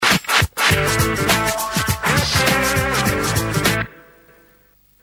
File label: Jingle